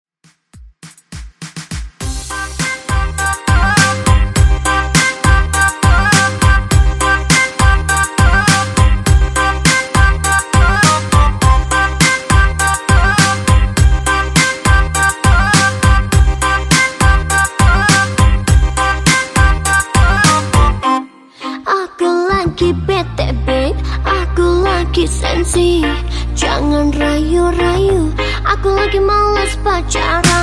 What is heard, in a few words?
Redrum 102 Bpm